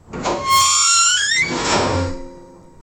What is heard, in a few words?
the sound of an electric, doors